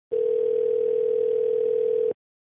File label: ring call